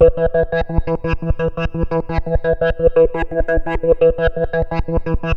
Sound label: T WAVE, Synth Waves, Techno Trance